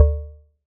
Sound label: PRC, Yamaha TX81Z synth drums